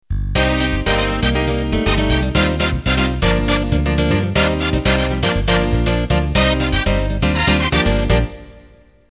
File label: Samba Ballroom Intro